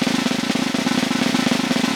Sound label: Vintage Snare Drum